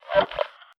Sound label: scoreboard popup extra, user interface; gmod; mw